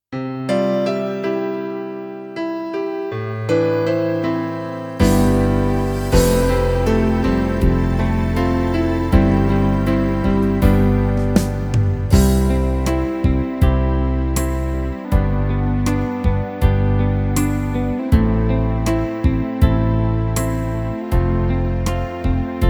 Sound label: piano Ballad 80 beat; The minus one track to play along with; guitar; Lesson 3 Guitar Vibrato